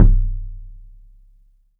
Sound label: THUD KICK; 3D Drums